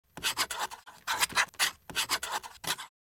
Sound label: Writing with a pencil on paper